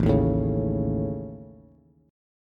A Major Flat 5th, Bass; strummed

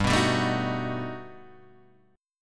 G Augmented Major 9th, Guitar, Half Step Down

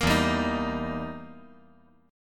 E Minor Major 7th Flat 5th E down

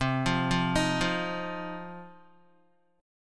C Minor Double Flat 5th, Guitar; arpeggiated; 4F,4A,5C,5G,5C,6E; 7,8,5,8,5,x; JGuitar

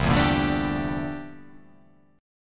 C 13th C down; C13 strummed

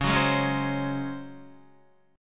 Db Major 7th Suspended 4th Db down Guitar; strummed; JGuitar

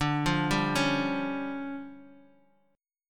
D Minor Major 7th Double Flat 5th, D, Guitar

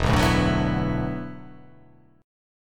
E 7th Suspended 2nd Suspended 4th, down

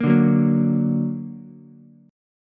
D Augmented, D, down, Ukulele; strummed; chord; JGuitar

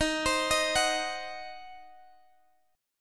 Eb Minor 6th, Guitar Open G

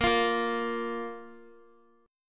Bb 5th Bb Guitar; Bb5 strummed

